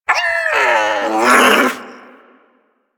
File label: Armadillo Vocal Death; Characters; Warframe; Hunting Critters